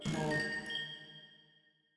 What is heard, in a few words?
ambience room dark temple ritual ready